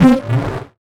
Buttons Mechanical; Adobe; Multimedia Internet CD-Rom Flash

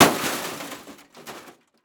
Crash DVD Player Slamming On Pavement; crashes; AdobeCC

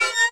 Sci-Fi Electronic Access Denied; Science Fiction

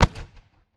Explosion Firework Explosion Mortar; Fire and Explosions

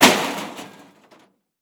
Crash Metal On Debris Short; crashes; AdobeCC

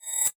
Multimedia Internet CD-Rom Flash Pop Up Short

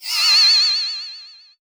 Animation Cartoon; Multimedia Internet CD-Rom Flash

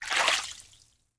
Liquid Water Water Foot Brushing Top Of Water

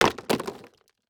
Impact Debris Large Plastic Drop On Ground; Impacts

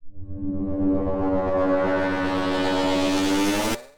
BRAMS, Cinematic Sound Effects

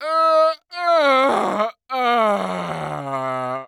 Human Male Moaning Weird Constipated Moan

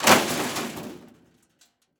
Crash Metal And Plastic With Debris; Crashes

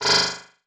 Multimedia Internet CD-Rom Flash Ding

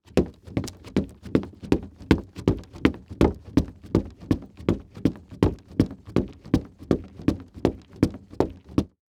Foley Footstep Golf Cleats Run On Wood; Foley Footsteps

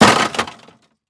Impact Metal Bang With Rebound; Impacts

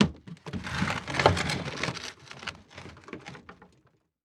Foley Wood Crate Movements

